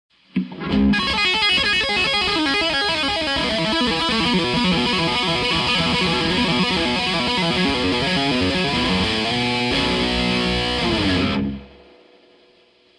Gain Lead

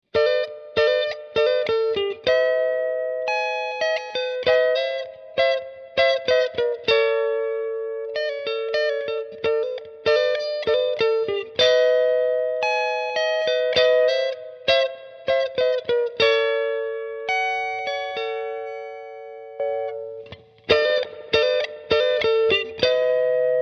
Off On; CompNova

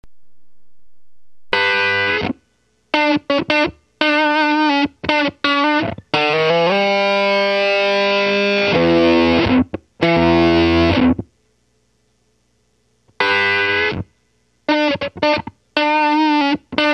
Microsynth

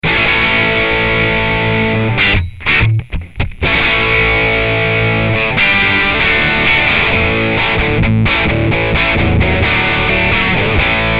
Hiwatt DR103

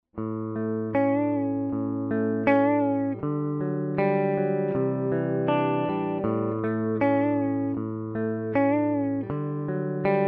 Roto Mod

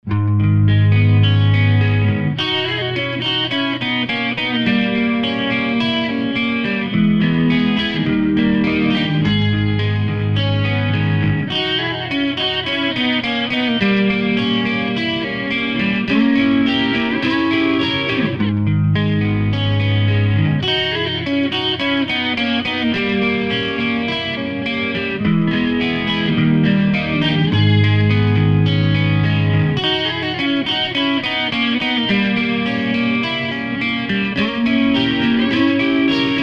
stereo clean krank

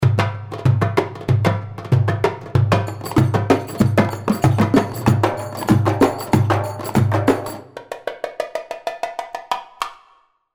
Fazani 095, darbuka

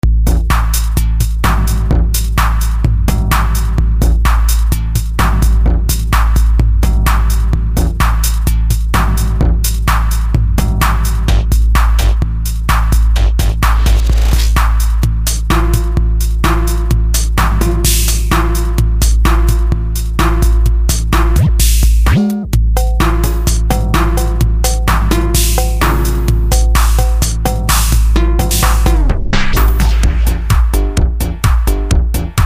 chrome plated techno kit

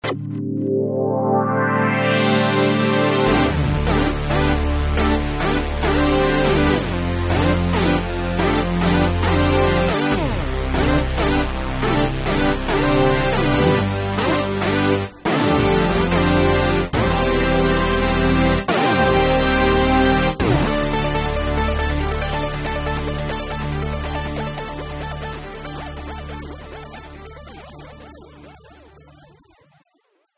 Emu Modular

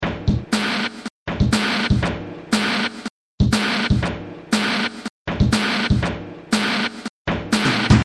Outland Kit

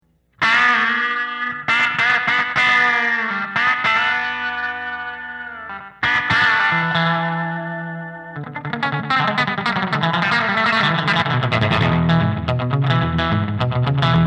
50s Rockabilly Rock n Roll

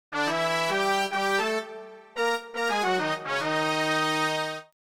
brass Section

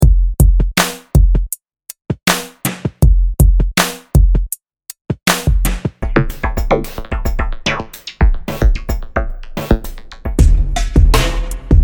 Loop; Hotbox V2

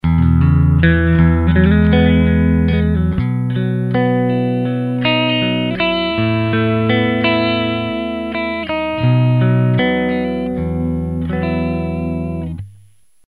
Roland Jazz Chorus JC-120

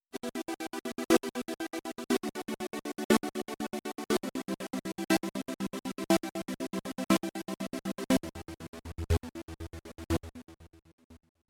16ths Punch Trem

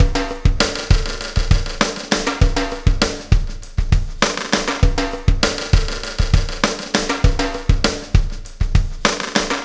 dance pack pulsar beat

